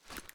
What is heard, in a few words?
ads down; reloads; gmod; iw8